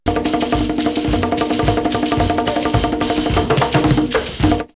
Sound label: Libreville 108, drumloops World Ethnic; X-Static Goldmine